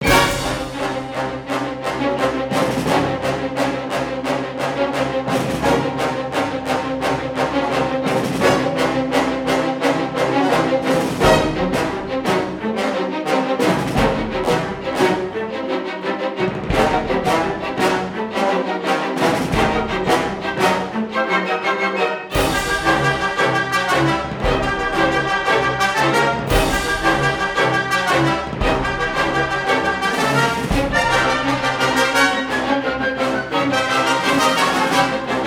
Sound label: Percus; The Adventures of Tintin: The Game; The Secret of the Unicorn; Xbox 360